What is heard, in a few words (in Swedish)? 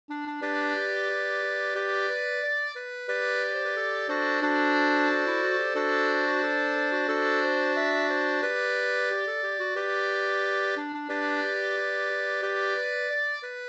ganglat Gdur; Gloggmarsch